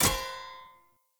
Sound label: Short Sword Medium Impact Metal; Player; Environment